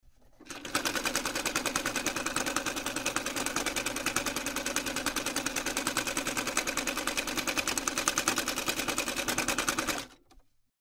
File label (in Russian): shvein mash strochit